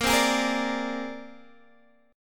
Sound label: C 7th Suspended 2nd Sharp 5th A sharp